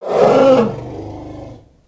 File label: Pet Elephant Idle; creatures pets